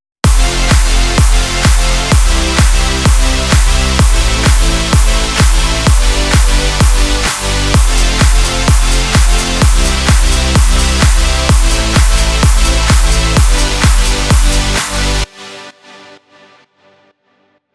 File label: Beats